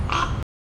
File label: Raven